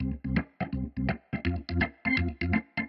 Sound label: Organ